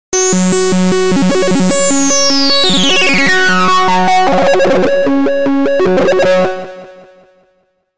Techno Acide mono

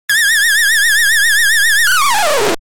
Nervous Synth mono

